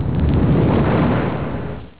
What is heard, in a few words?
thrust